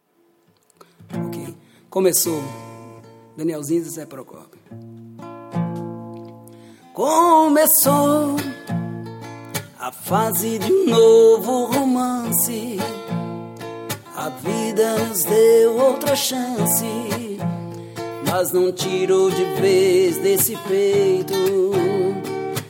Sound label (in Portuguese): sertaneijo romantico